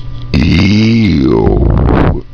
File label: iiiiiii